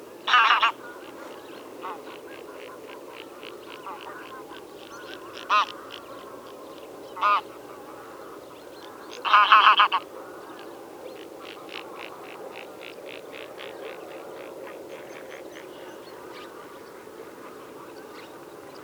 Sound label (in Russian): seryy gus golos